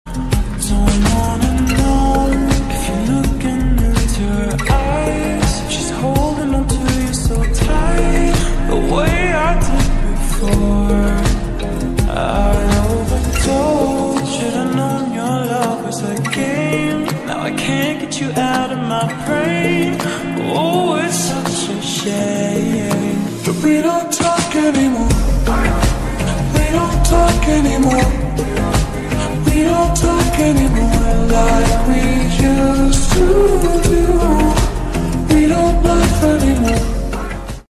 Aesthetic English Ringtone

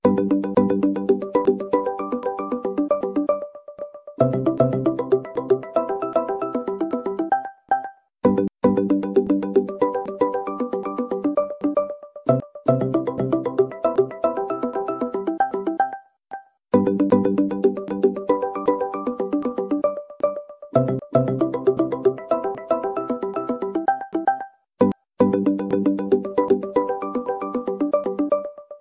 Mobile Ringtone; Vivo

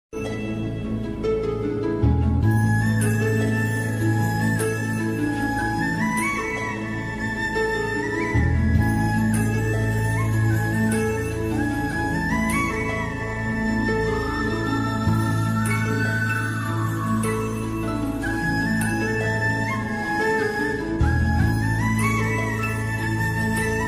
Sad Bgm Tamil Ringtone; Shajahan; Vijay

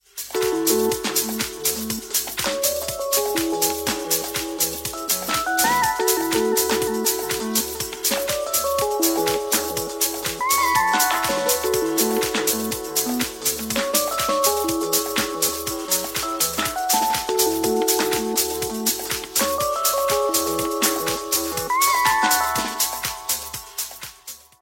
Ringtone; Samsung Galaxy Tab S7 Plus

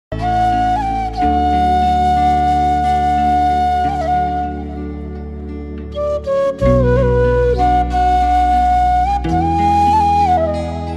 Flute Ringtone

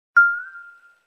Alert Notification Sound Lg Ringtone

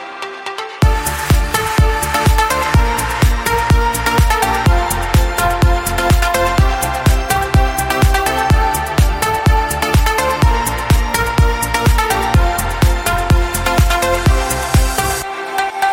Huawei P Smart Ringtone

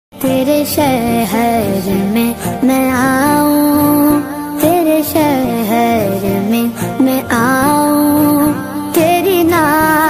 Islamic Naat Ringtone

